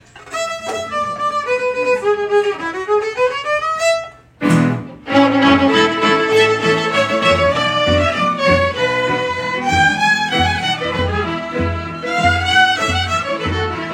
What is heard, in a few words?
Polka, Orchestra Recordings; Violin 1 Violin 2 Flute and Whistle Rhythm